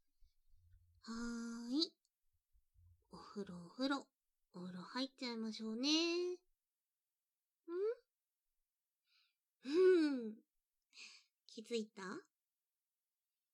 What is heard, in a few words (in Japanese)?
炭酸シャンプー; 炭酸シャンプー買ってみたの（リバーブなし）